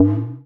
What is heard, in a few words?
perc mod lift